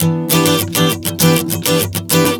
Strum 100 Em, One Chord Strumming 100 bpm

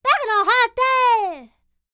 Vocal Planet World Voices, NOMADIC

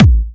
Trance Kicks, Bassdrums

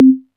CONG TOM, CONGA BONGO

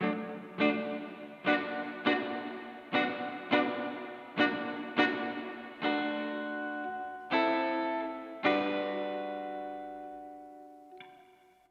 Soul Guitar, 70s Analog Funk

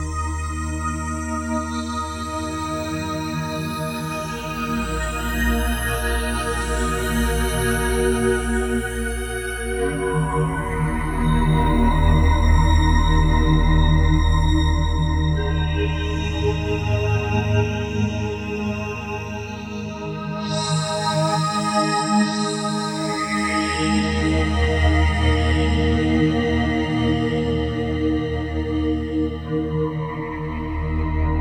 Oriental Scape; Sonic Foundry (Sony Creative Software); Ma Ja Le; Soundworlds

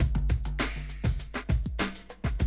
drum loop, 100 BPM

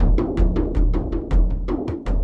Frame-Kendang Busy, drums; Sonic Foundry (Sony Creative Software) - Friendly Fire

